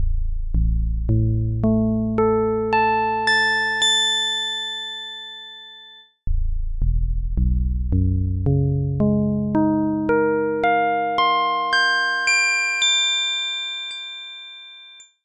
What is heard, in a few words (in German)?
7 Oktaven 12 Quinten; "pythagoräische Komma"; Psychoakustische Grundlagen der Musik